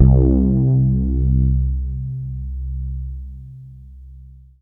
FRETLESS C2; Greytsounds; Scott Peers Sound Engineering

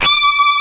LEAD, DISTOFX; East West - Global Sounds

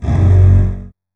DOUBLE BASS

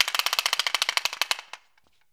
CLACKERS; E-MU Producer Series Vol. 7 – Old World Instruments

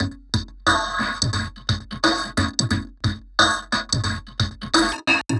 Beats, Synchro Funk; Awash; Sonic Foundry (Sony Creative Software)

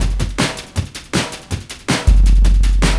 Noise Beat; Drums & Drones

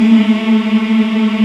VOX African; Roland LCDP11 Africa VOL-1

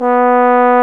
Tenor Bone; Solo Brass; Trombone; Tenor Bone 2

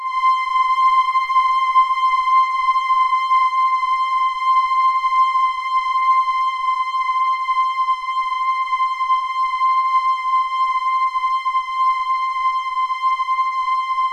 JV-1080 PEACEFUL; VINTAGE-EXP

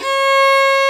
fiddle C#4; InVision Interactive; Lightware VOL-1; Instruments & Percussions

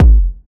Kick, Kickdrum; Equipped Music; Loungin House

